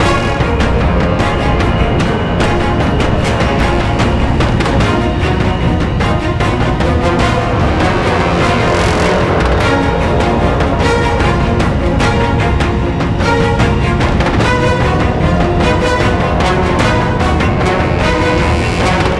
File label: Combat High Loop; Battlefield 1